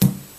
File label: smash cone; monsterplex